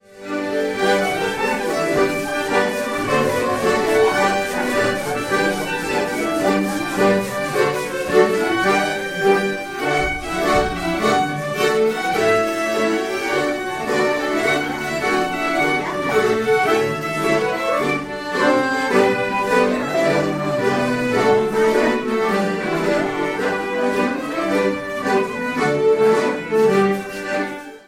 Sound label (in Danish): sekstur; fra brandelev; fynboerne; almindelig laugsaften